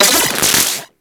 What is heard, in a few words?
Split Laser Beams Join; Warframe; Corpus